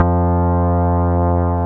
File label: BAS MINI, MOOG; Sound & Vision - Gigapack II CD 1